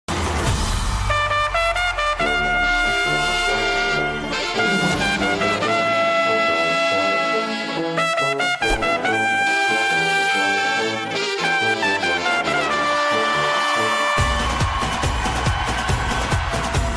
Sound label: tamil friendship bgm